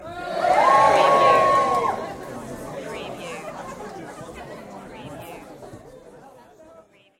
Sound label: Audience Cheer; beanstalk audio